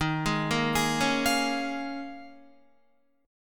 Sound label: Eb 7th Suspended 2nd Suspended 4th Eb, Guitar; Eb7sus2sus4; arpeggiated; 10,11,10,13,13,13; JGuitar